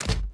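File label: droid bodyfall lg dirt; droids robots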